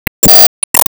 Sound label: Enemy Glassy Common Appear